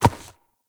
Ram Step Walk Dirt; Animals; Duviri

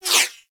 Laser Whizzby; Warframe; Bullet Impacts; Whizzbys

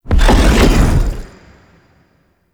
Teleport; Zariman; Void Angel; Abilities

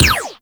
Shot; Warframe; Weapons; Tenno

